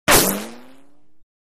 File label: Bullet Ricochet Off W